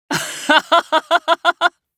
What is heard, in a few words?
laugh, JPN; Eternal Return